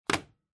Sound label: Camera Disposable (Put Down)